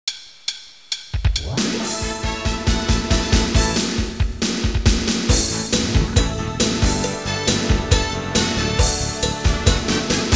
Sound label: rock, qchord